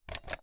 toy nerf gun trigger pull misfire; strongarm

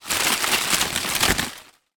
tree branch leaves movement; foley; zapsplat

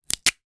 sticky tape rip roll gentle; office; zapsplat

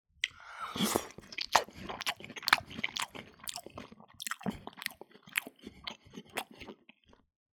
human eating stir fry close up; zapsplat

